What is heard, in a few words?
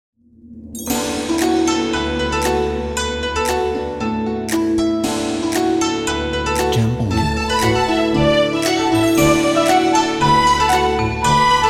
China (Chinese New Year)